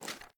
reload arm, weapons; gmod; viper

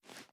reload mag arm, reloads; gmod

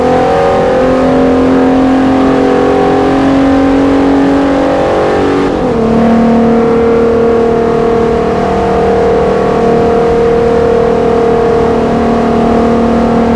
fourth cruise, vehicles; golfvr6mk3

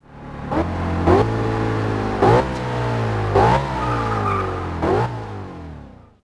throttle off, vehicles; gmod; tdmcars; rs4

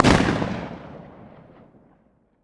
shotgun fire atmo ext; gmod; viper

